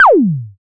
FX Analog; Sound & Vision - Gigapack II

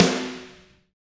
SD Real, Roland; Sound & Vision - Gigapack I

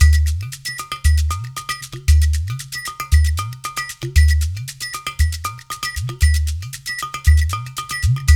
120 UDU, Udu Hip Hop

